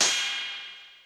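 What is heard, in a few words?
Electronic Cymbal, Yamaha DD-35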